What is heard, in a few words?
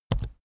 keyboard laptop enter press fast soft; kommunikation; computer